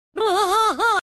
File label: bwah